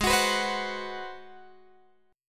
G sharp 7th Sharp 9th, Guitar, Open G

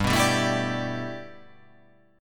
G 6th Add 9th

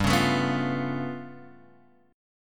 G 9th Flat 5th, Guitar, Drop D

